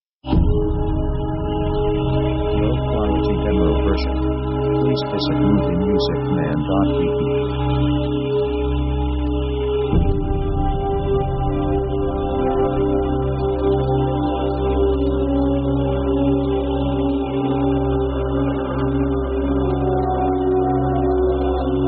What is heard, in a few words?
a sad story; synth themes